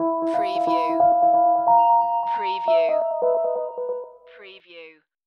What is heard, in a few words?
Hip Hop Dark Rhodes Licks 90 BPM Swing - E